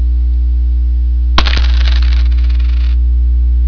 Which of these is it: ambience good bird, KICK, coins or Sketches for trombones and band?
coins